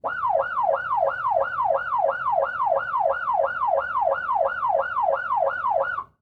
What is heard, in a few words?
Police Car Siren Interior; Auto; Transportation